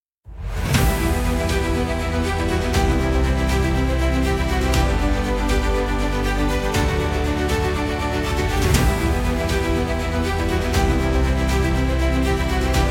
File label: intense emotional motivational music